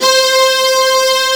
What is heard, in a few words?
C 3 CELLO; AKAI S6000 CD-ROM - Volume 1; Strings